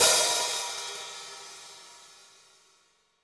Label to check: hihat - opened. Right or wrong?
Right